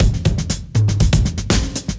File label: breaks, 120bpm; free bespoke breaks; BeatStyleC